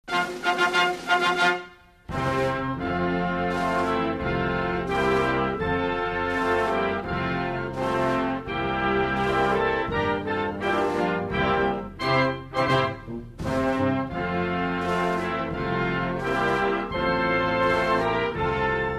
Iran Anthem

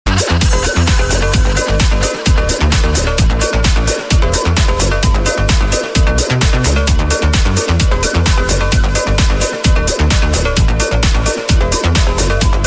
Trance

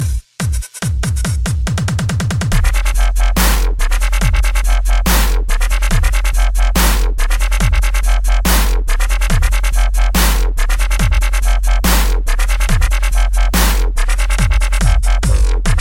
Super Duper Beat

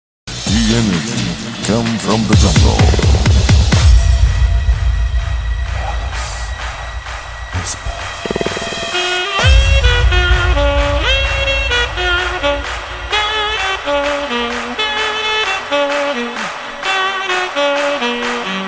Beautiful Saxo Tone

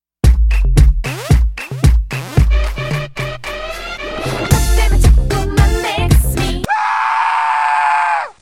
Breath

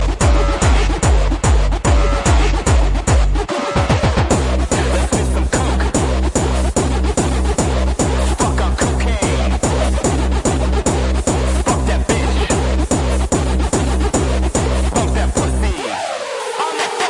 Hardstyle

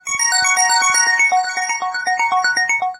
Android Zte Crisp

